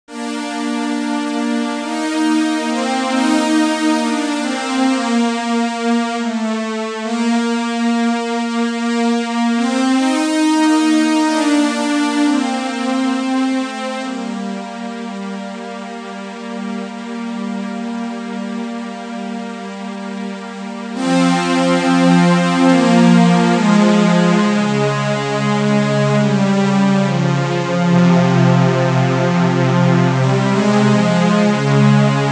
Vivaldi Strings; OXA

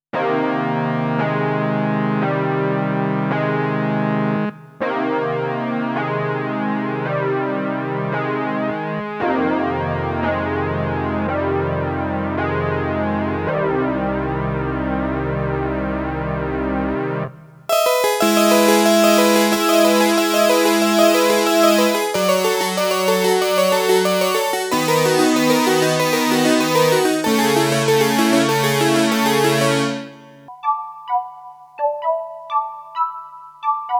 Boss CE 1 Prophet 5 Chorus

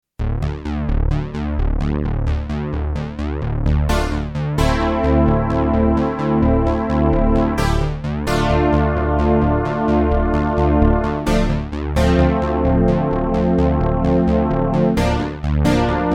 MKS-80 Single PWM

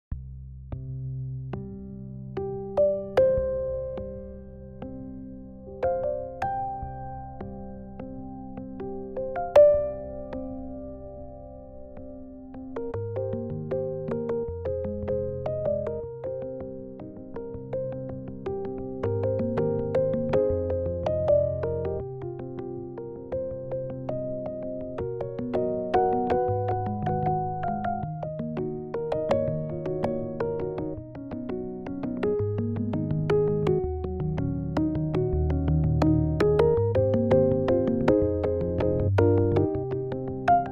Absorbing Piano Taps Yamaha C7

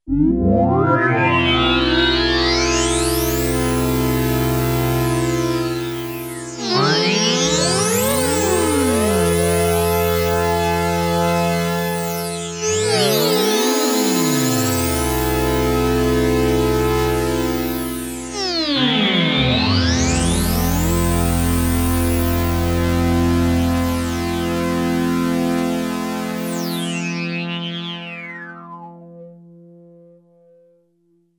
P600 Pan Reso Pad Portamento

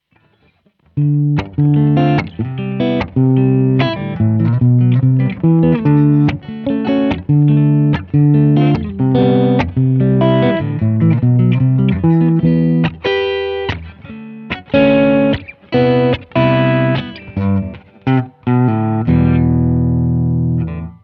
Neck Humbucker

